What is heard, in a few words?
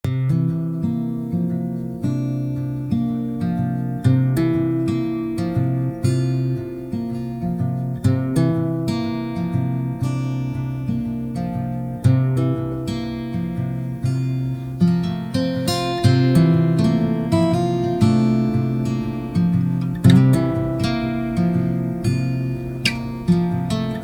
acoustic fingerpicking